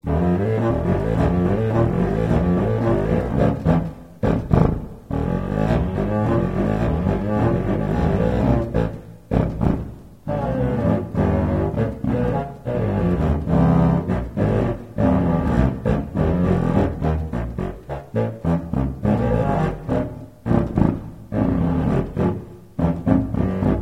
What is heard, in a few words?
Duet